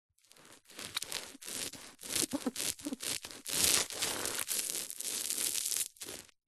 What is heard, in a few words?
office sticky tape stuck to carpet finger rub squeak